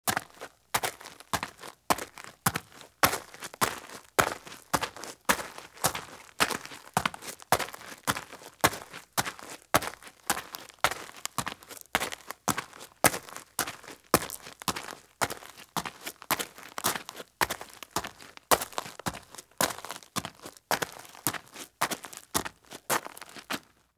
Women's High Heel Shoes Walk at Very Fast Pace on Rooftop Pea Gravel; Sound Ideas; Footstep Sound Effects